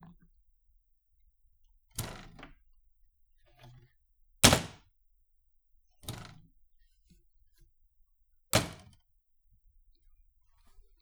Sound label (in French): Machine a cafe; ZoomH2N; Maison